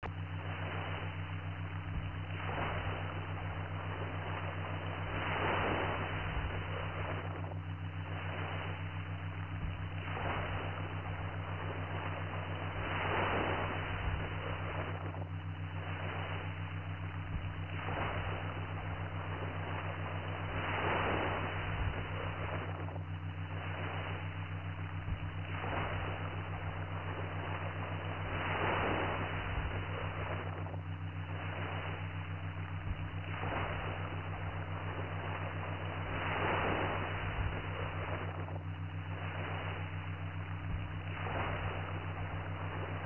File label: Upper Theta with Mid-Level Mix, Theta Brainwaves